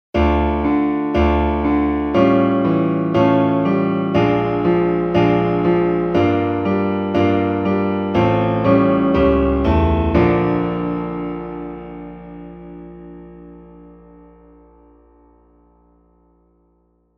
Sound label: Serious Piano; Soundtrack